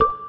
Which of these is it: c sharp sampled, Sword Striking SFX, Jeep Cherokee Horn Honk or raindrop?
raindrop